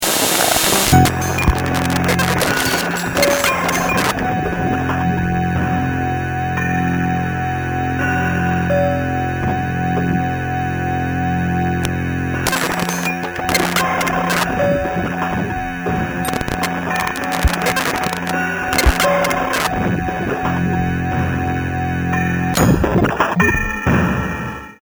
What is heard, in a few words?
bass drone